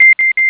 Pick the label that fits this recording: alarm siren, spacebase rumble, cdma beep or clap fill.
cdma beep